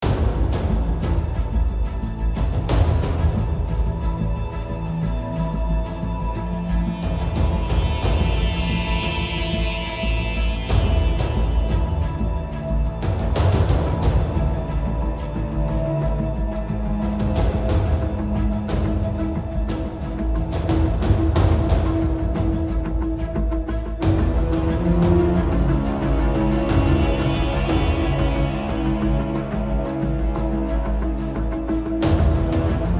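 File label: Music Creepy Weapon Crafting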